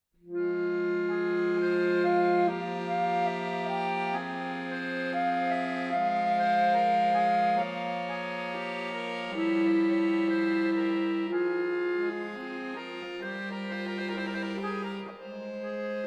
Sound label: Prelude in F minor, accordion